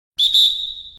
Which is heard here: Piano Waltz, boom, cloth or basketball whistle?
basketball whistle